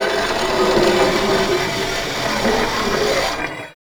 Piston Raise Water Large; Warframe; Eidolon Plains

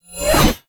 Bayonet Melee Combo; TnBayonet; Warframe; Tenno; weapons

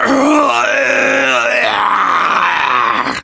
Death, Barks; Kuva Lich; Persona D

